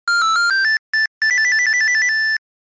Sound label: 8bit; asa saezuri